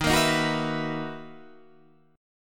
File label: B Major 11th D sharp, guitar